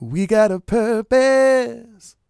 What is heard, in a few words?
SING, Vocal Planet R&B & Dance; ILIO